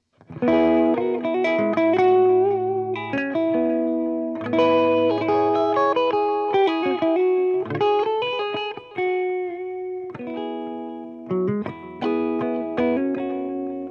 juicy clean

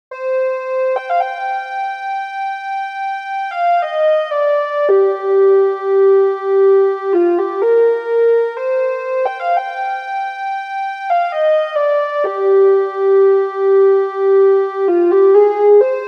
Pulse Lead

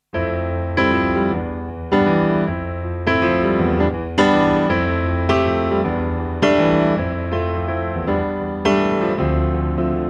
austrian Grand

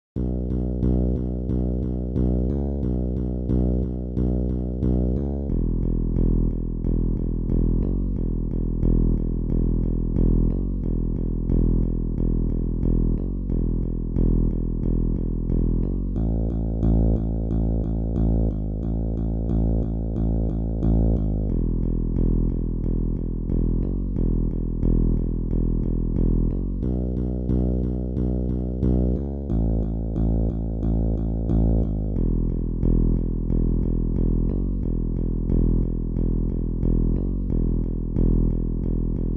raw bass

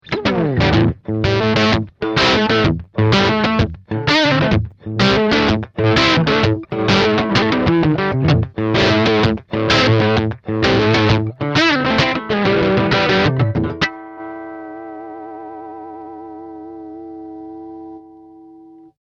Crunch

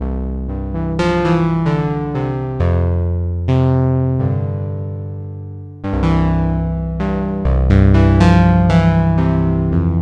behringer ubxa velocity

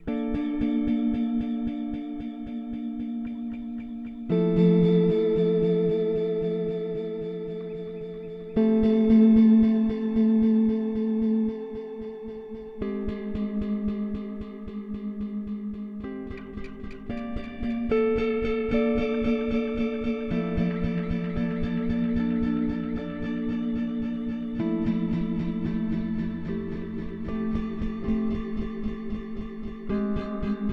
Starlight Echo Station Precision C

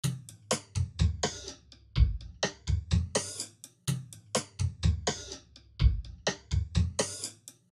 Phaser Preset

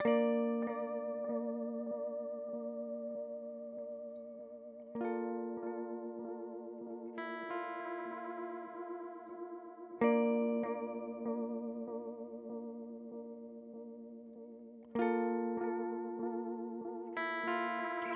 Pigtronix Constellator

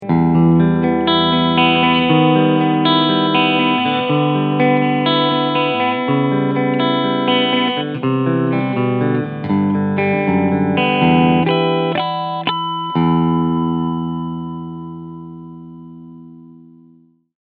TELE DELUXE Clean Sound beide PUs; Fender AV II 75

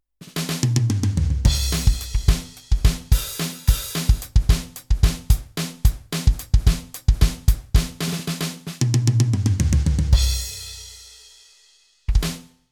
Octapad

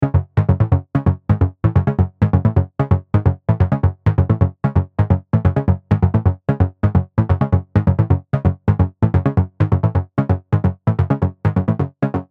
JX3P Above The Clouds Bass dry

